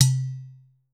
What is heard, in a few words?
udu, percussion; BRT UDU D; Voice Crystal - Steve Reid Definitive Percussion